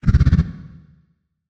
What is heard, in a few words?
ENDWORM damage; Barotrauma; Legacyendworm